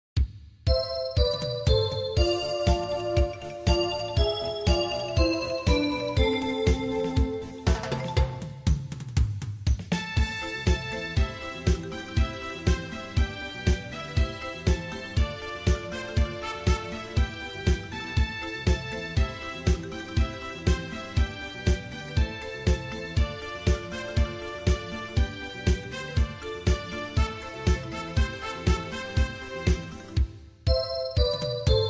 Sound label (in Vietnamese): den xanh den do beat, nhac beat